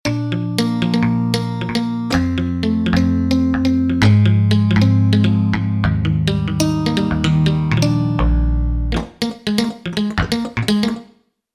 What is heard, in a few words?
Pop Strat, Roland JV-880 Factory Patches